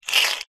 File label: foley money australian 5 cent coin slide short on tiled table; zapsplat